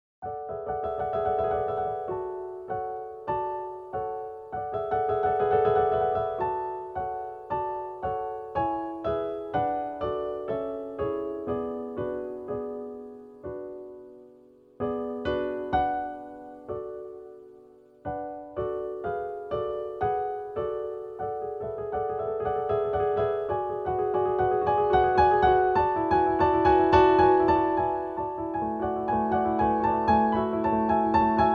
Carillon

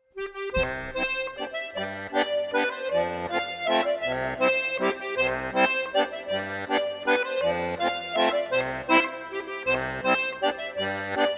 Mazurka; Mazurka à Michollet

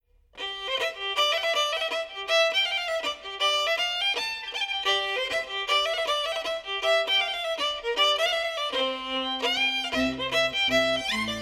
Mazurka

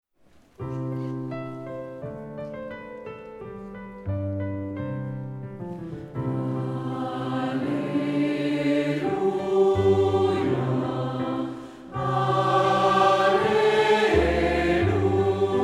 Alleluia canon

